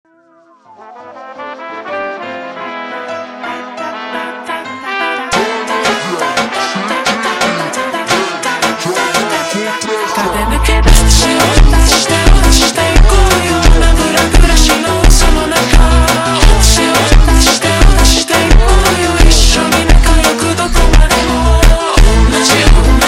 Funk super Slowed

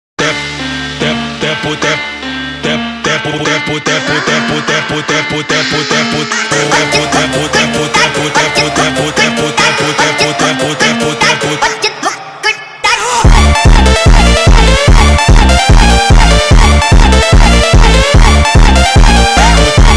sped Up

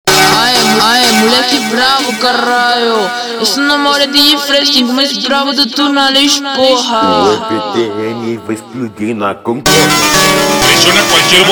Funk slowed

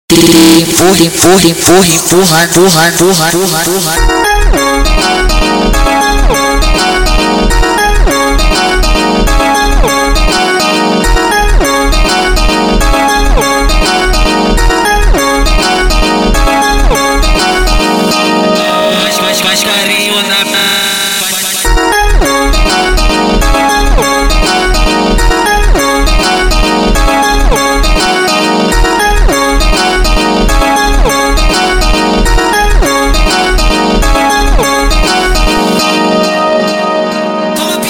sped Up

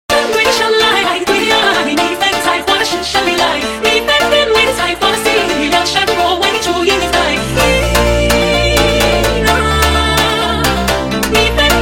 Jumpstyle

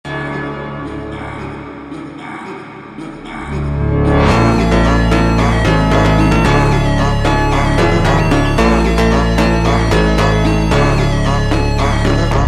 slowed Reverb

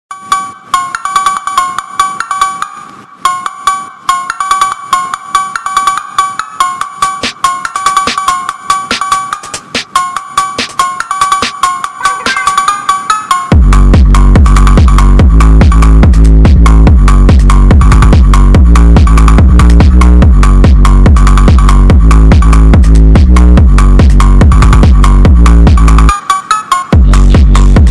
SPEED UP BASS BOOSTED

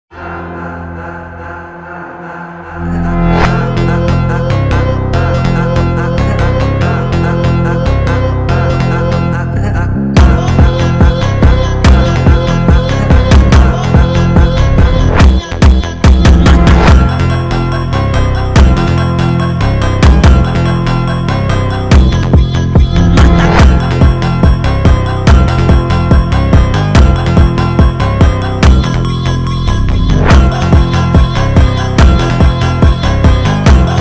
Phonk Sped Up

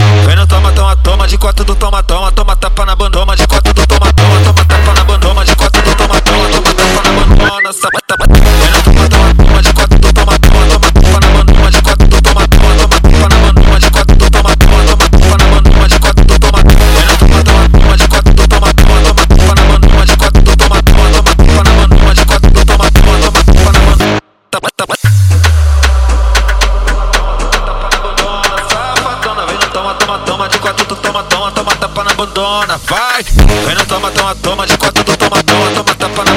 BATUCÃO

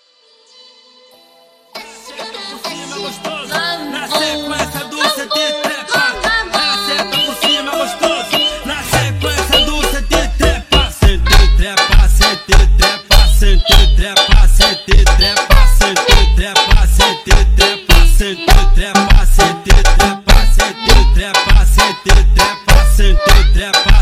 super Slowed

